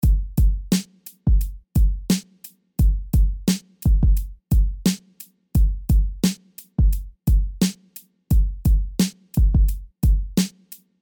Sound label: Drums